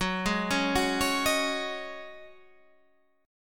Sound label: Db Suspended 2nd Suspended 4th Gb, chord